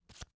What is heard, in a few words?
Black Jack Card Enter